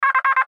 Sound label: Radio Morse Code; Morse Code Letter C; Morse Code (Sound Effects:Electronics); Blastwave; Warfare